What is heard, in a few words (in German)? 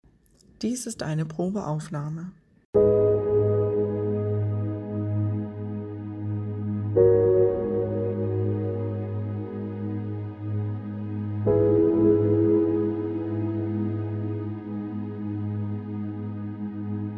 417 Solfeggio Hoerprobe Silent Strings